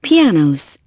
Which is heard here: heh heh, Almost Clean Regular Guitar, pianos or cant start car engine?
pianos